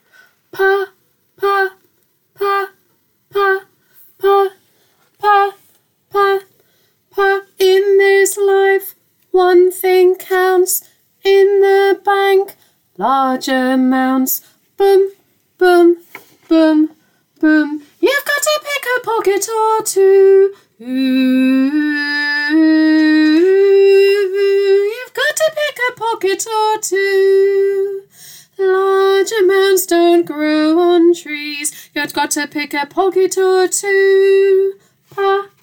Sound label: alto part intro